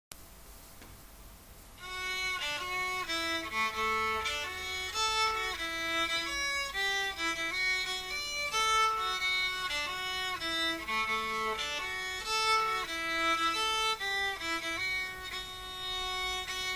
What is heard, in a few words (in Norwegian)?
Rallarpols 2. stemme